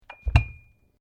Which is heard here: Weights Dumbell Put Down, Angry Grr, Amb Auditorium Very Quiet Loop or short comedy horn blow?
Weights Dumbell Put Down